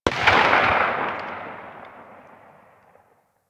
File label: Weapon Enemy Tail Outdoor; Warframe